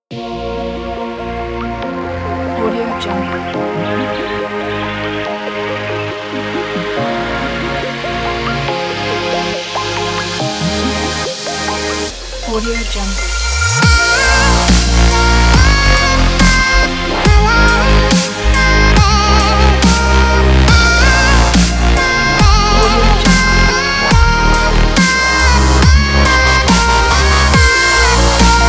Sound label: dubstep